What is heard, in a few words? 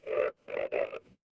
animal frog